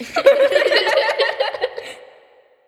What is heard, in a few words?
Girls laughing; Girls laughing FX